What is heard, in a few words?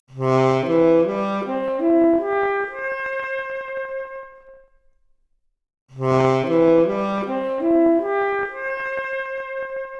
Saxophone arp, Stereo output noised; 4bit